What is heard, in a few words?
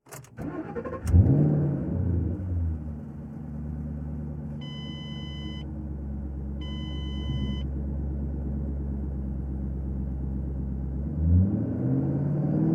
Ford GT 500 KR, Mustang, Start, Rev, Idle, Rev, Stop, Interior; Transportation; Car; BlastWave